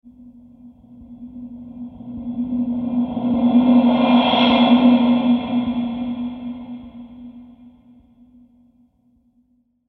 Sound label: tonal sweller